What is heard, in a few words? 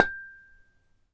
Mug, Found Percussion Hits; ModeAudio Found Percussion Hits